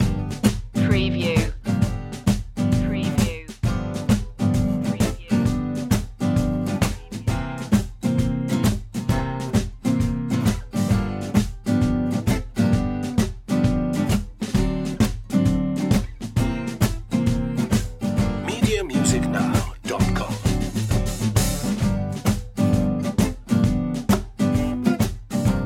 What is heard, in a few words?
belly up blues loop